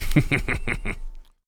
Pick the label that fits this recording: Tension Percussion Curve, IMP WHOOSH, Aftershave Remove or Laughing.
Laughing